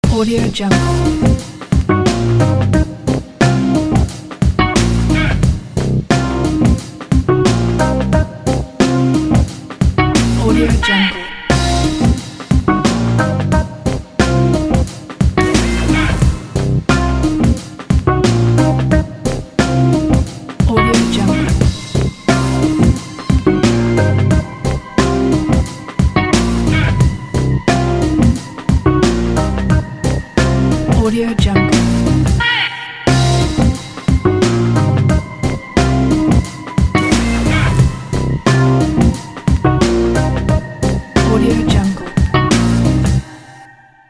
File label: hiphop loop